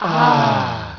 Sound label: AHHH